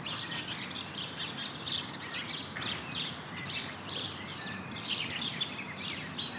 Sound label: bird in; Mafie 1